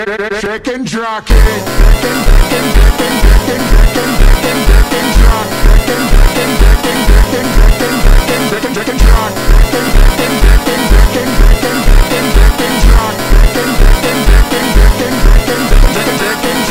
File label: chicken jockey funk